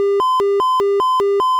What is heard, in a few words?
master alarm